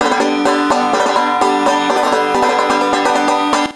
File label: Race (Win); Fisher-Price Outdoor Adventures: Ranger Trail